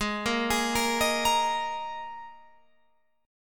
G sharp Suspended 2nd, G sharp, down, Guitar, Open F; arpeggiated